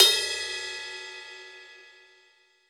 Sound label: Pop Ride Bell, Cymbals; Roland BK-5